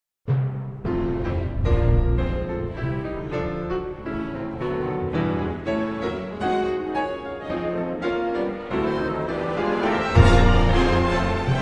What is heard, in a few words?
Concerto For Piano and Orchestra, Live at Symphony Hall; Symphony Hall, Boston 2019